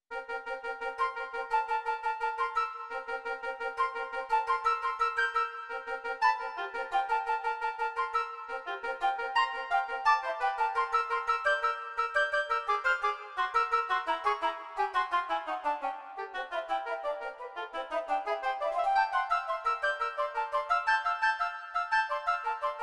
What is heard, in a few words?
agitato; bagatelles